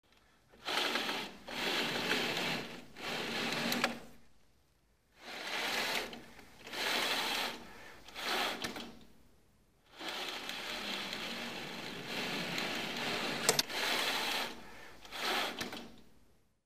shtori otkrivanie-zakrivanie s usiliem

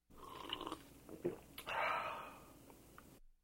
potyagivanie kofe

